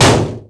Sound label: car; Mafie 1